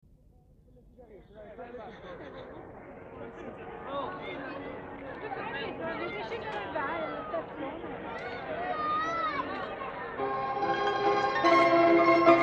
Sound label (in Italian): VALZER DI MODENA